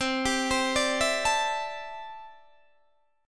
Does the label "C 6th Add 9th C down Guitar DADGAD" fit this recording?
Yes